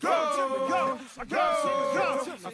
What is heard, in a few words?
go chant; Drum loops